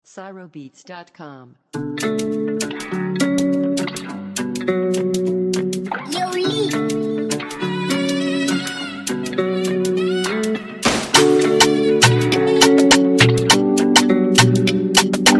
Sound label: Afrobeat Afroswing Type Beat